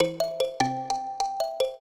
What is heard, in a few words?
trio, mbira; Mozart